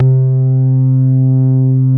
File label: BASSES